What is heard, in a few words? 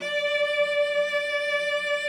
CELLO VIB D5; Xsample VOL-7